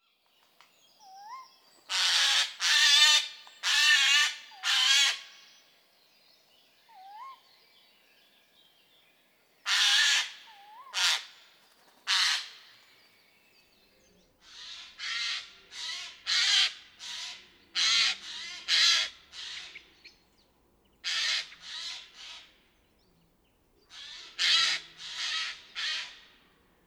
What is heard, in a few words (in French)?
geai des chenes; oiseaux de nos forets